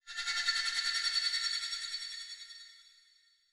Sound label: Explosion; Evolve; Gorgon; Lurker; Mimic